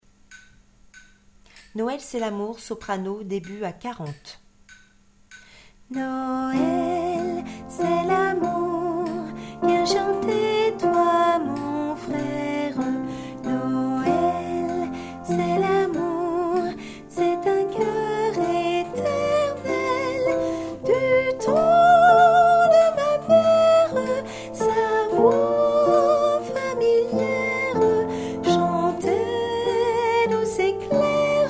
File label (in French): soprano